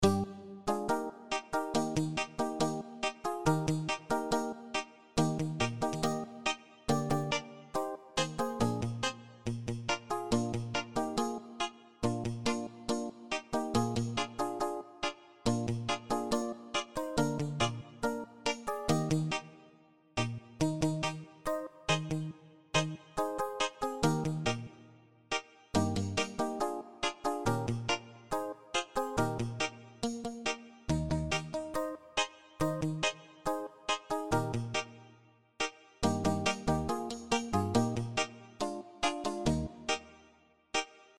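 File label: Funky Guitar Rhythm Guitar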